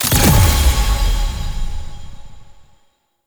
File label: UI Catalyst Install; Mods